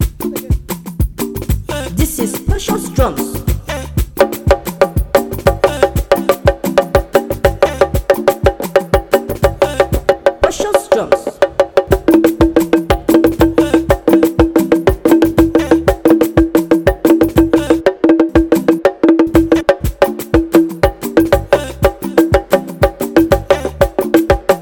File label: Conga Instrumental